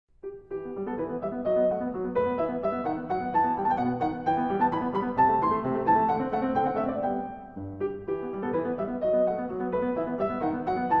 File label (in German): Sonate